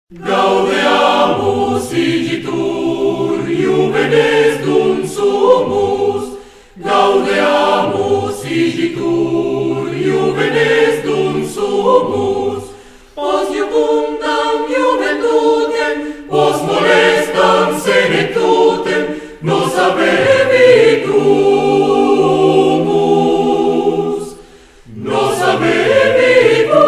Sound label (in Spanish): Himno Gaudeamus Igitur